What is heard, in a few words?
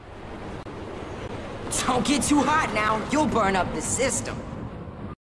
Glitch; Dance Central